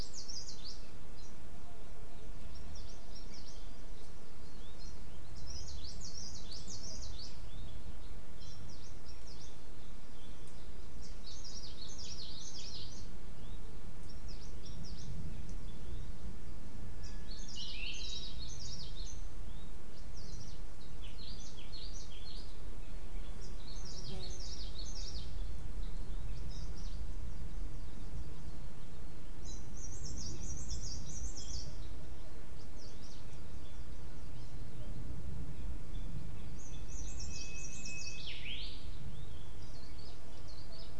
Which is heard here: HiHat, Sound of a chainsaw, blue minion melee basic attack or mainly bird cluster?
mainly bird cluster